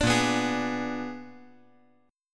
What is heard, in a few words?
Bb 7th Flat 9th Bb; Bb7b9; strummed; JGuitar